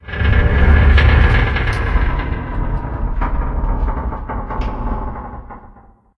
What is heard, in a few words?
Metal Stress